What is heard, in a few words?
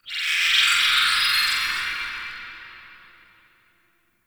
High Tech, Ambience; Guild; Warframe; Misc Remaster